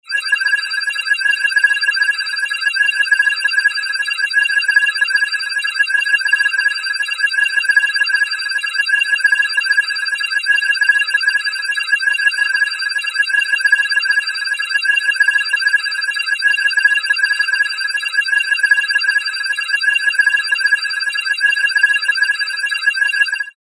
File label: high computer